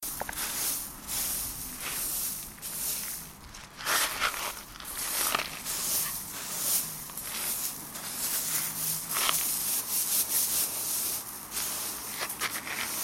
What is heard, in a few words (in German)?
Kieselsteine